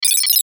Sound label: BUTTON BLIP DIGITAL; Multimedia; Buttons